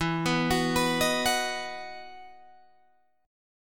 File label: E Major 7th Suspended 2nd, Guitar, Open G